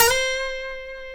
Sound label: D, funk guitars